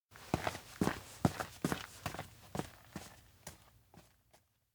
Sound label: steps outdoor event leave; koyomi; anemoi